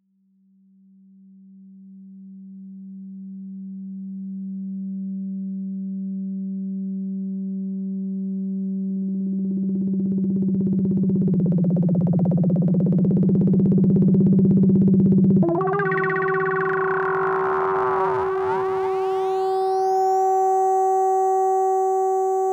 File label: ppg1002 filter FM